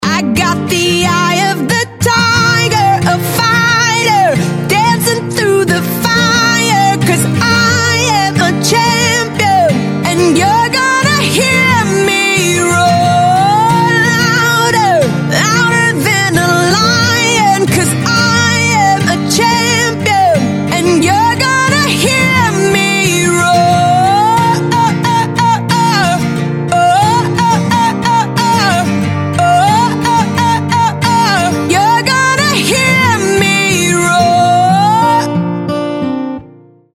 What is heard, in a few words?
acoustic